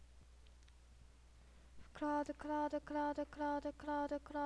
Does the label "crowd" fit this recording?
Yes